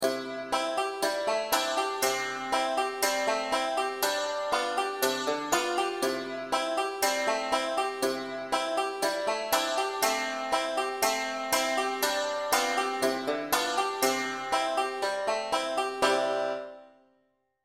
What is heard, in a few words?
accompaniment, banjo; 5 string; aunt rhody